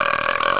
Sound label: burp